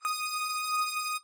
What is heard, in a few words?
lead